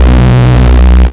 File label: bass